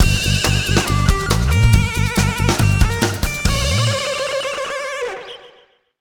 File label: (Jingle) Victory; rayman arena; Razorbeard